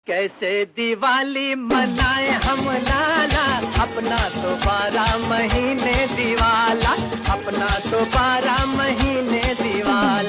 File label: Ringtone